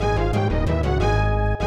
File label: Victory in Duel; Daikoukai Jidai II; PS1